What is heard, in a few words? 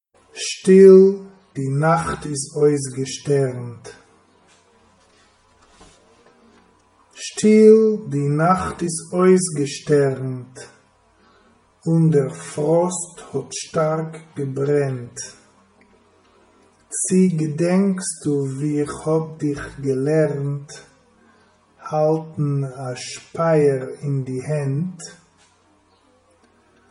Stjil die nacht